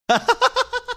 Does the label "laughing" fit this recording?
Yes